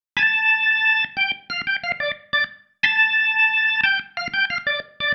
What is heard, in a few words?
Single Riff, Organ